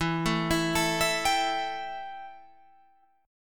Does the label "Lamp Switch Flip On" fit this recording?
No